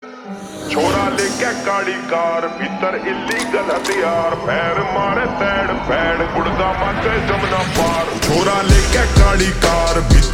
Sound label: Slow Reverb Version, Ringtone